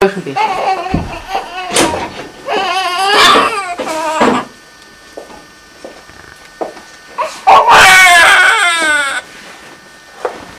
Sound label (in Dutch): eerste keer huilen